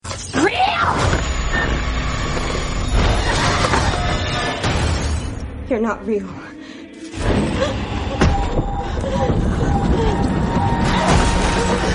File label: horror movie ringtone; rings